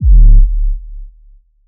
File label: Drop Overdrive, Sub Drops & Boom FXs